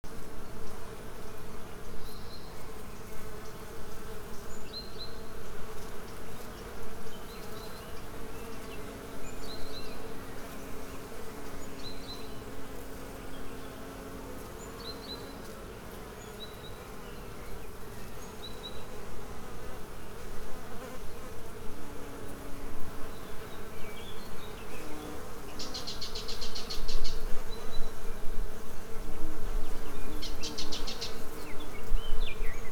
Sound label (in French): abeilles dans amandier